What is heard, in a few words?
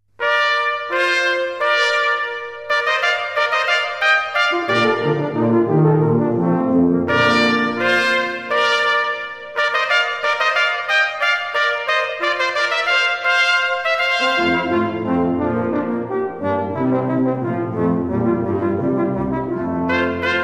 sinfonia con trombe allegro